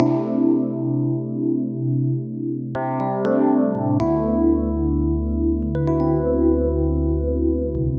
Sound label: Dreamy, hold music; Gizmo Project